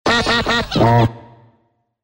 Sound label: reload